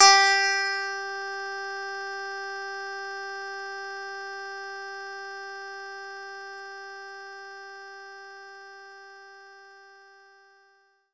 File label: Clean Guitar G4; knights try